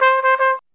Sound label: acid jazz trumpet